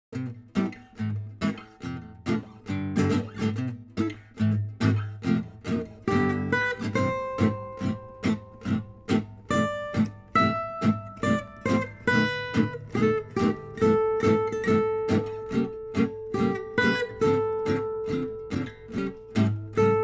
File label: opening melody template